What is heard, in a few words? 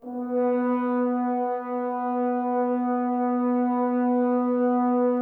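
brass, French Horns; Sample CDs; Miroslav Vitous; Woodwind Brass Ensemble; F.Hrns Links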